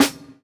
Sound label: Snare, JUNGLE BREAKS